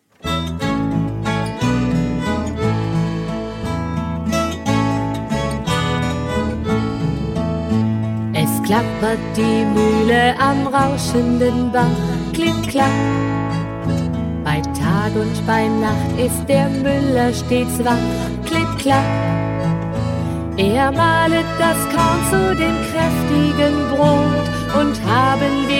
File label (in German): Es klappert die Muehle am rauschenden Bach